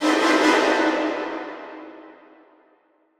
Stabs Strings High, horror strings

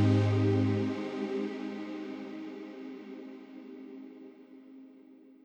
Airy Pad

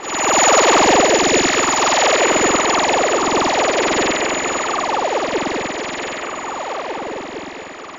Laser Sweep; FREE SFX PACK VOL 1

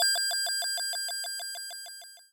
error, Retro Sounds 32-Bit

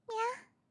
meow, Catgirl; Catgirl Fighting SFX

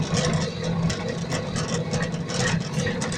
machine; 30 cc0 sfx loops

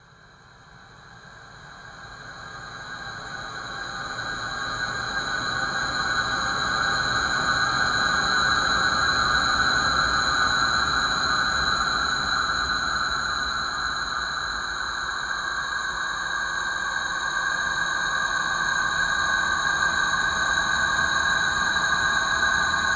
Retro Ambience Stretch Large

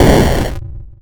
explosion big

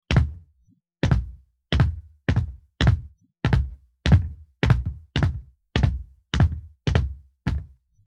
Metal Footsteps; horror; horror sfx vol 1

